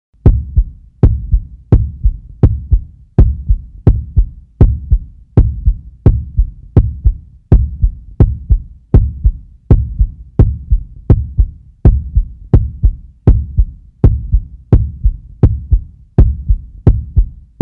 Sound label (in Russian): zvuk serdcebienija